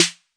short sd 808, snare; single hits

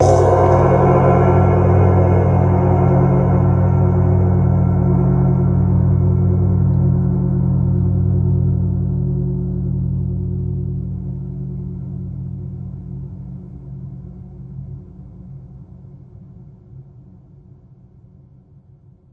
biggest gong ever; Gongs and Super Crashes; single hits

